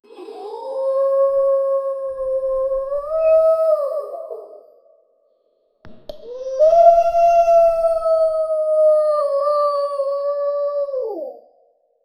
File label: canyon creature broken